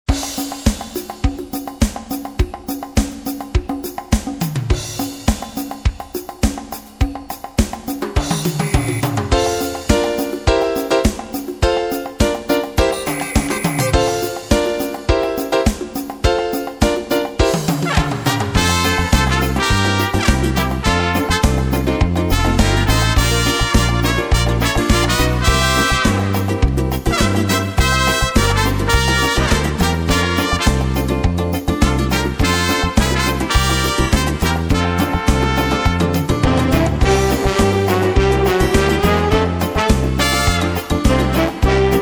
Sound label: Samba